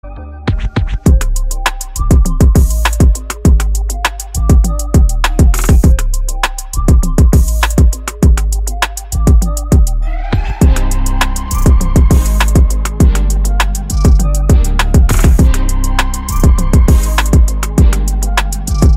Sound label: instrumental